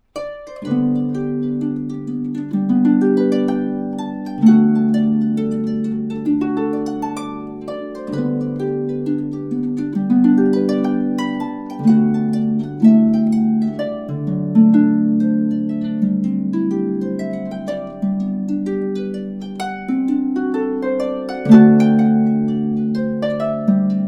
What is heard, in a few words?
Irish Country Dance